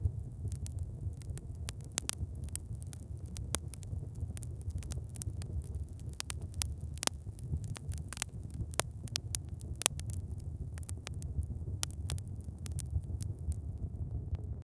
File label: sounds of bonfire burning